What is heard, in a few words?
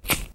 lighter